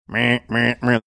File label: making alarm sounds